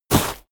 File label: step walk gravel